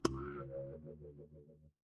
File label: cartoon boing